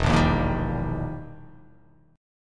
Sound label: E Minor Major 7th Flat 5th, E, down